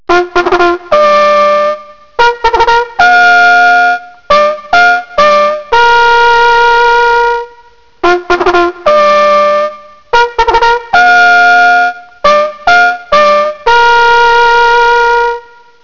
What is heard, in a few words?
full dress call, bugle sounds